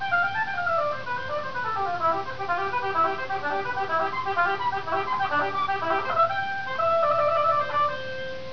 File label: oboe